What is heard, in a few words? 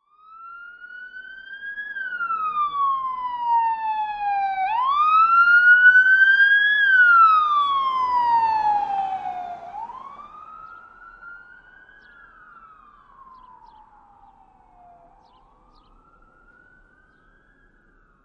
Police Siren: Fast Car By, with Various Types And Engine Noise.